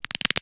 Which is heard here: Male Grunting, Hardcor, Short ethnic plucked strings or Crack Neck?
Crack Neck